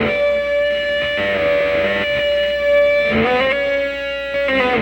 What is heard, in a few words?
Eee Ooh, Electric Guitar; Sonic Foundry (Sony Creative Software) - Friendly Fire